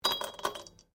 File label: Ice cubes Glass; Drink Mixing Sound Effects; Drink Mixing (Sound Effects:Bars)